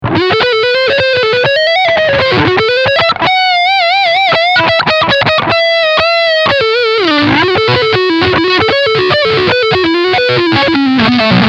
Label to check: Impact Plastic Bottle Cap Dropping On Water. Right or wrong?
Wrong